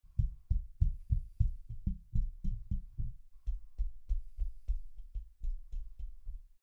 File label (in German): DIY Shockmount - AKG H100